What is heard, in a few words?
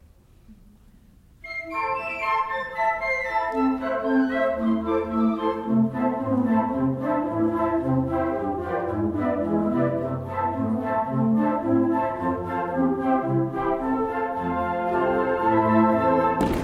Jewish Folk Dance Suite, flute; Mayim Mayim